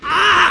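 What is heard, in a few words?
foul tackle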